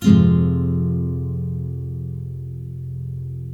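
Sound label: NYLON, F MINOR UP, CHORDS; Spectrasonics; Hans Zimmer Guitars VOL-1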